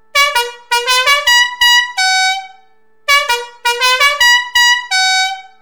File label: fiamm m4 la marcia del ponte river kwai novelty penumatic music horn trumpet bocina; car van